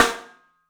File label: MARCH, 3D Drums; 3D Sound; MARCH XTREM